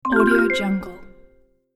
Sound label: super marimba notification